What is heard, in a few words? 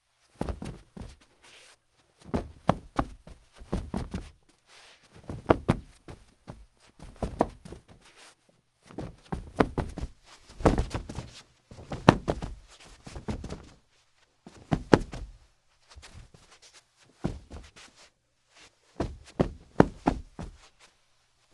bare feet carpet footstep stagger tumble trip drunk; sonniss gdc